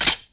inspect, weapons; czero opforce

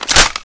clip in, HK416c

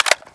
mag out, AR-10T

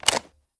sniper mag out; czero opforce

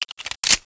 shield clip in; czero opforce

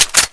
g36 bolt forward, weapons; csof

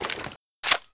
SR25 clip out; csof

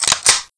galil bolt pull, weapons; czero opforce

